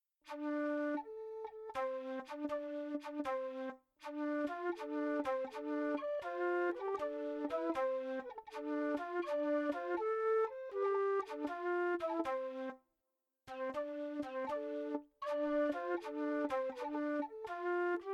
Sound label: flutes; ERA-II von Eduardo Tarilonte; ERA-II Software; Best Service